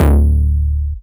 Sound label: Bass Cuts; Vengeance Samples; VES1 Instruments